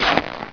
dirt, player; wartso

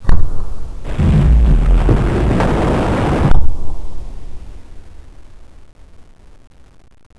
lever

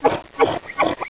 pit drone communicate; betapitdrone

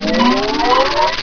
probe alarm

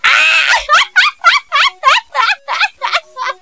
aahaha

